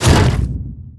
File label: Leap land; Evolve; Goliath